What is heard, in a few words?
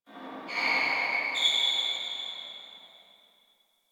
Distant Shoe Squeak F; Warframe; Ambience; NineteenNinetyNine